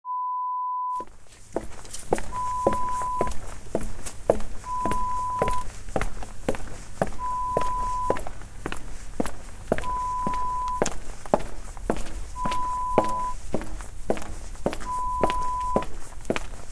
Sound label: Footsteps Alley